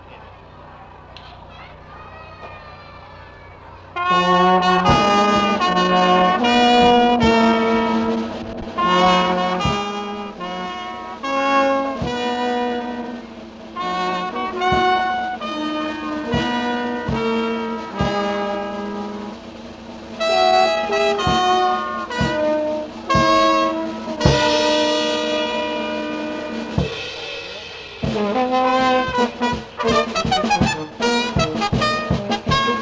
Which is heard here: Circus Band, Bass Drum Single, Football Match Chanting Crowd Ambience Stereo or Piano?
Circus Band